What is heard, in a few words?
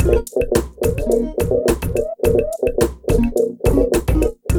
BEAT, Beats 105-150bpm